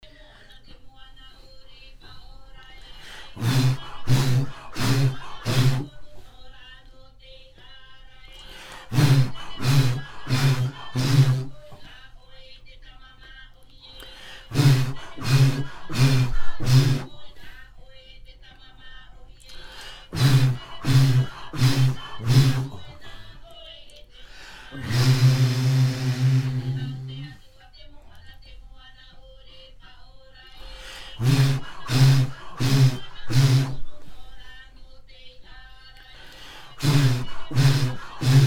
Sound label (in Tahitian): Himene tarava Raromatai